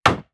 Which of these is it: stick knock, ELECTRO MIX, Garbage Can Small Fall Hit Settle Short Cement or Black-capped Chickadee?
stick knock